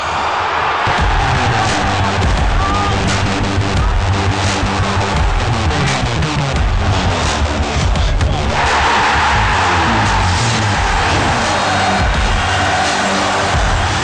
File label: rhythm